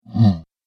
Giant Ogre Confusion, monster; horror

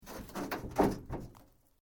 Metal Sheet Move; Materials

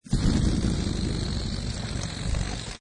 Cartoon Balloon Long Flabber Fart; Comedy/Cartoon, Balloon; Funny Sounds; Lynne Music

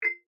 Phone Cell Beep Notifier Generic; Cell Phones; Electronics; Big Room Sound

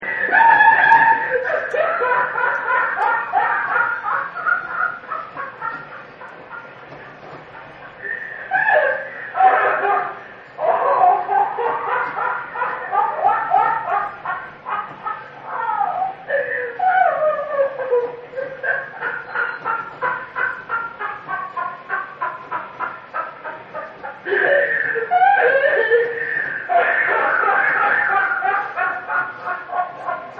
Crazy Laugh; Backgrounds; Arcades

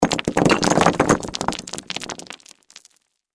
Impact Rock Debris Spill; Materials

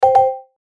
Notice Chime Mallets; Buttons; Multimedia; Blastwave

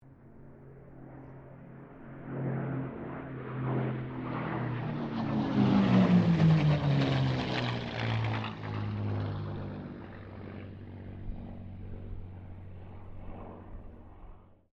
AIRCRAFT CA-18 MUSTANG PASS; P51 /CAC-18 Mustang; Airplanes

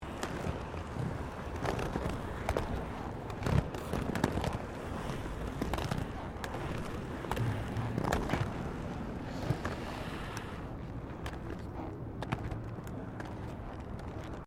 ROLLERBLADING PAVING MIXED MANEAUVERING

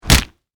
Impact Punch Face Hard; Punches and Hits; Combat

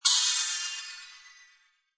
Magical Burst Shimmer Bright; Magic sound of casting spell, conjuring or wizardry. Witchcraft ritual summoning elemental or o; Burst Sound Effects

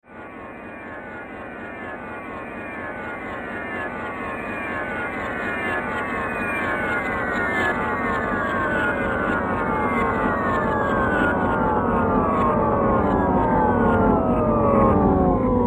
Steampunk Slow Down Engine; Spacecraft Sound Effects; science fiction sound effect; star wars / trek style games; Andrew Fleming

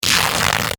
Fabric Rip; Ripping (Sound Effects:Objects)

